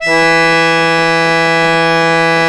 ACCORDION E3; USB Soundscan vol.63; Balkanish Traditions; AKAI

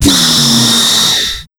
TECH 140 FX, Big Beat Drumloops; AKAI

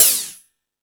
Hip House, drum machine

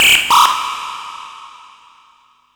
SONAR, Hard & Loud Techno

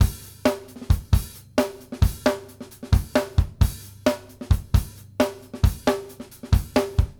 BASIC C R, Drumworks

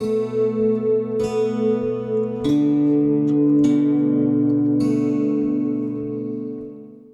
Kantele Cykl, Acoustic String; Sonic Foundry (Sony Creative Software); Friendly Fire

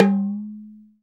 PER Percussion

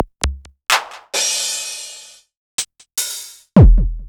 Korg Electribe ER-1 Kit

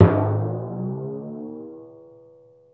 Gut Buster, Classic SFX, cartoon; musicradar; cartoon caper

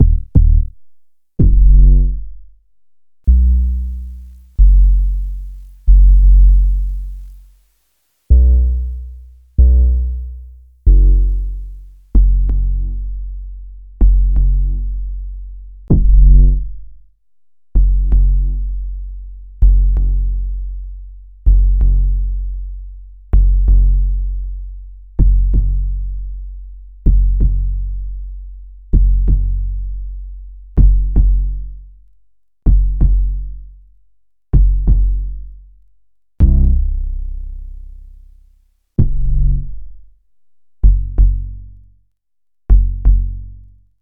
Bass High Quantity

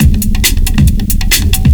LOOP FX, Big Beat Drumloops

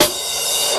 Snare and Reverse Cymbal

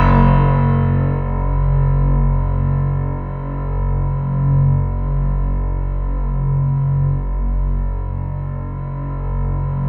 F#1, Synth Line; 90 s Sample CDs; NiceBeats; SynthLine VOL-22

